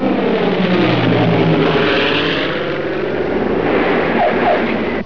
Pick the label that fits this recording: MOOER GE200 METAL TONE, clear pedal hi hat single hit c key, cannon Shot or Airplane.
Airplane